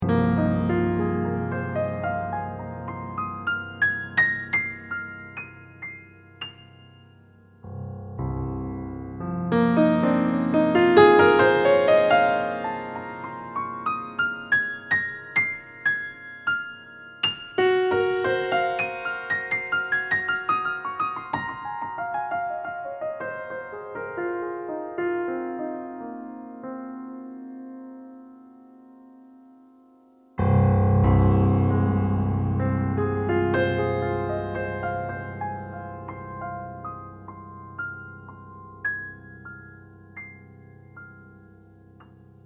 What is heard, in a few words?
Bright Piano